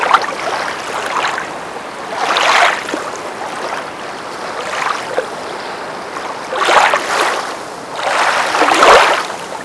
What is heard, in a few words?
subterranean water wave